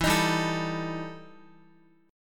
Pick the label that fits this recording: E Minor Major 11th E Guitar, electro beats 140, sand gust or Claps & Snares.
E Minor Major 11th E Guitar